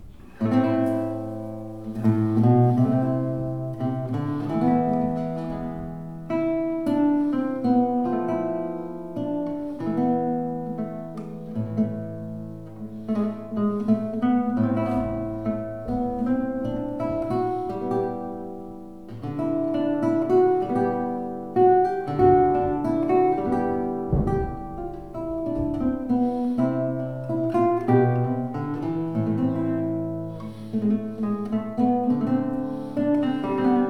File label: guitar, Church; Canwich Church